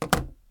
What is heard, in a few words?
put cell phone